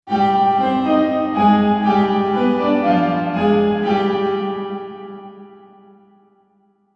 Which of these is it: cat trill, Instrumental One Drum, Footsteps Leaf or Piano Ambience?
Piano Ambience